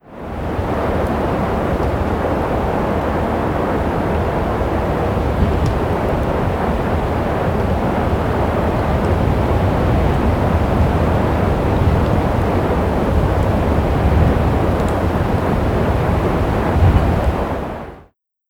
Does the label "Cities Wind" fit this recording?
Yes